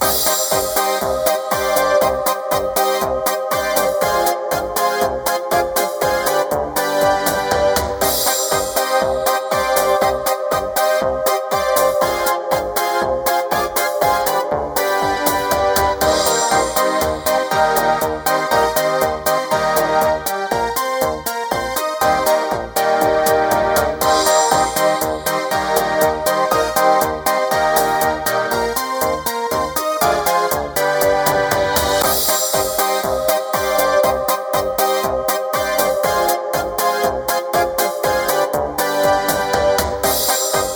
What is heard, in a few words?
Rag Loop (Radio); Gremlins; Gremlins Gizmo; wii